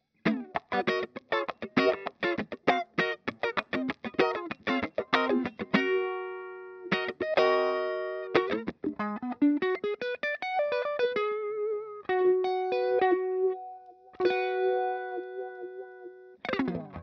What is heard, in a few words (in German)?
Depth auf 0 Bass